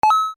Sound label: coin; ragemap2020